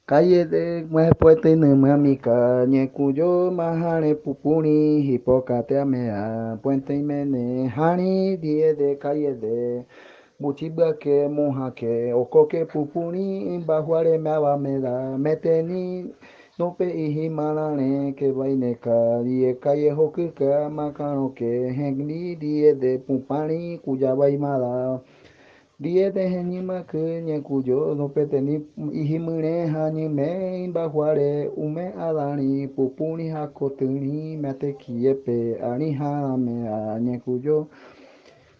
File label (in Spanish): CANTO CUBEO